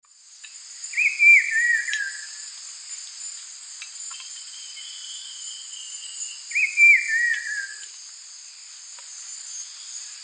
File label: Saltator grossus, song; S. America; Eastern Lowlands